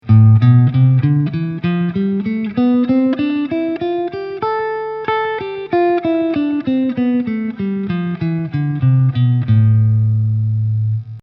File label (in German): äolisch auf und absteigend